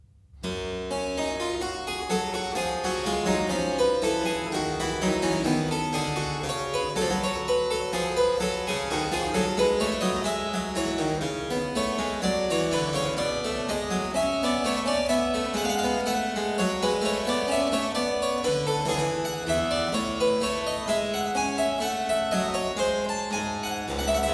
Zweistimmige Inventionen in G minor, Keyboard Works; BWV 782; J.S. Bach; Inventionen & Sinfonias